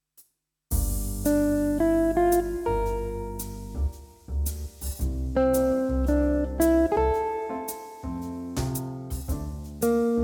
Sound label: Korg Pa4x Acoustic Jazz